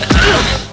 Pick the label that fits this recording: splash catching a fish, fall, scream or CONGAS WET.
fall